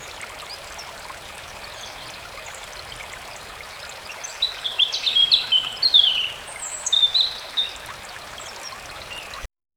floresta com um pequeno, creek

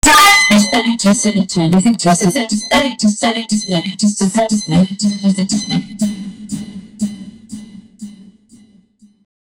quero um son de rave, electronic music

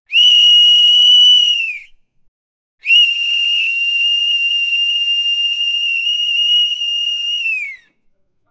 faz o som de um, volleyball

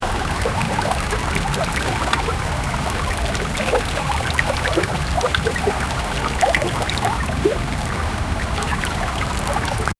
barulho de agua quando, fishing

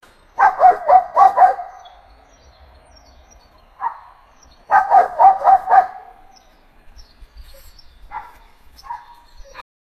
loop som de uma cidade, wild west

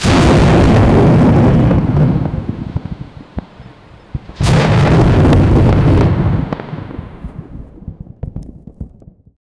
som de eletricidade raio, thunder

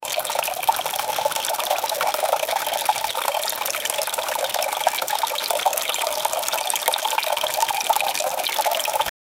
agua fervilhando, boiling

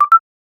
som de ui de quando, error

